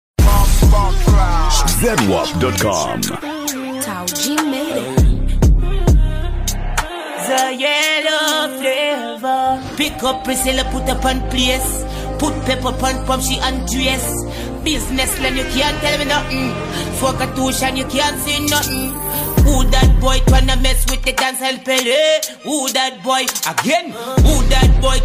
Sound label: riddim freestyle